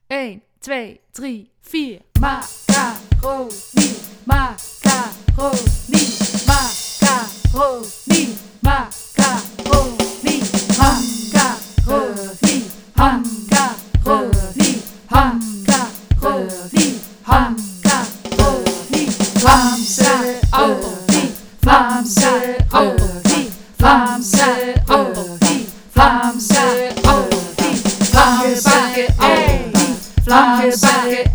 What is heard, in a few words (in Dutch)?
met zang; Genre: Blues